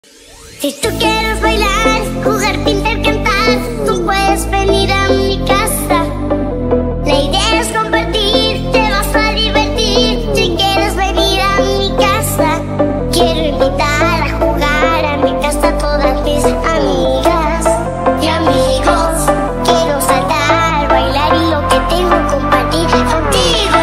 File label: phonk remix; amv